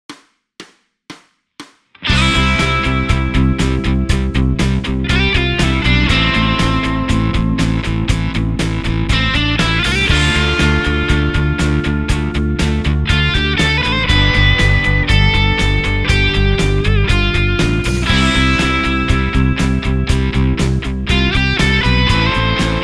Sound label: adrib very good